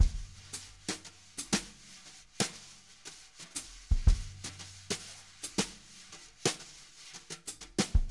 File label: jazz brush loops